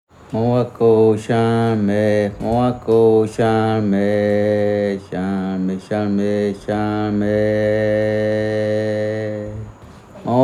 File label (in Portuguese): Canto do Mutum; Wakoi; Paiteri